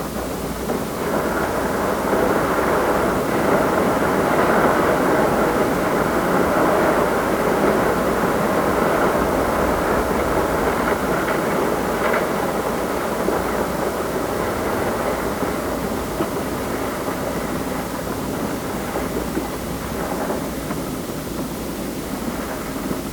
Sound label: Rustling waterfall